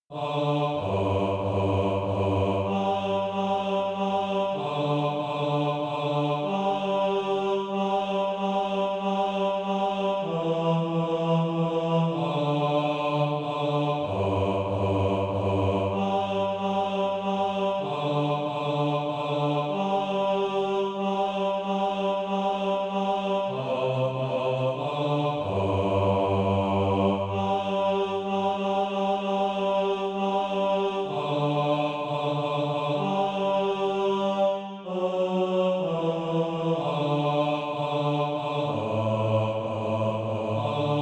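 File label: bass, Practise Music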